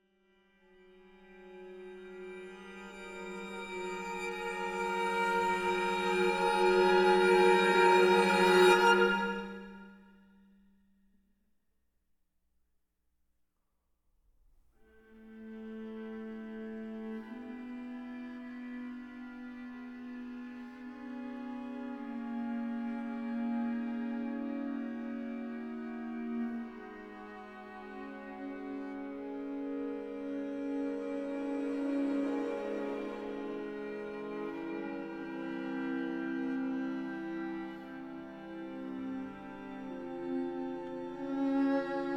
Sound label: string quartet